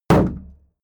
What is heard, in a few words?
shield sheet impact dent